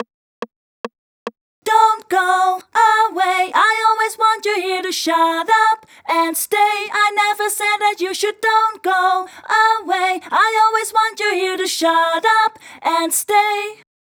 Chorus Dubbed, 142 BPM; Vengeance Samples; Hello You

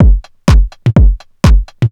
drum loop 125, puristic, vocal house; PS-28 Vocal House Samples; drumloops

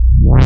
Bass C, Offbeat Bass

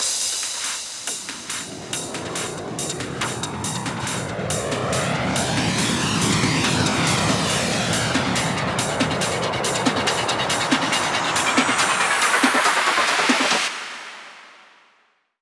140BPM FX 8Bars; Reload; Vengeance Samples; VUF1 Snares&FX Pairs 140BPM

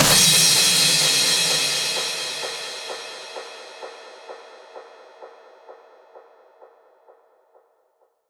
Crash, Cymbals; Vengeance Samples; VEH2

